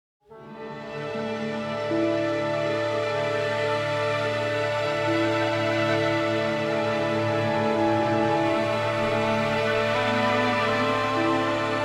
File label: Farming 90BPM